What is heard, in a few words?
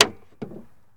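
Truck Cherokee Hood Open; SFX Kit; Trucks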